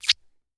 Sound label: Human Male Kisses Cheek